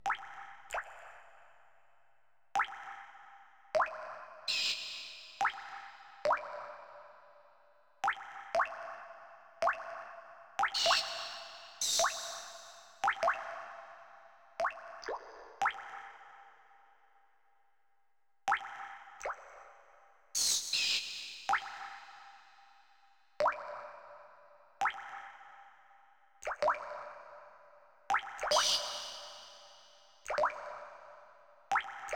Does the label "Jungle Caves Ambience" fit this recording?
Yes